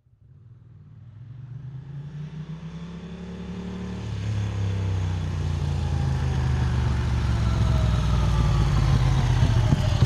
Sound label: Motorcycle Road Racing